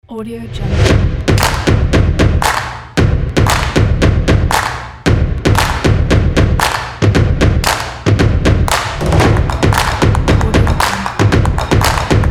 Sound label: percussion